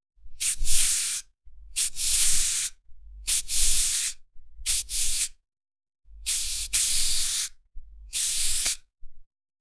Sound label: asmr sound effec, beauty